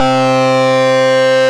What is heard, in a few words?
SuperSync Bass; Synth Lead; Ableton; Creative Extensions